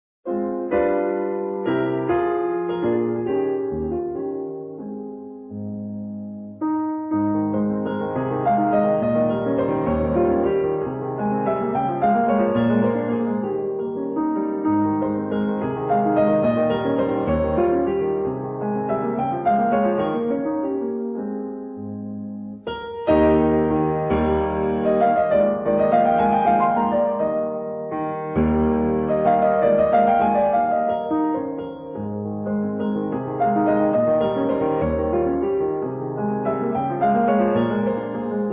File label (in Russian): Vals Ab Major; Griboedova